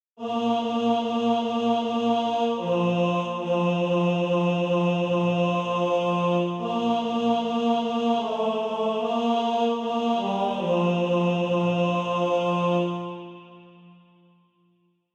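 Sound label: tenor, SATB Practice Music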